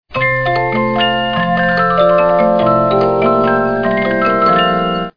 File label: 50-Note Movements, music box; Invitation to the Dance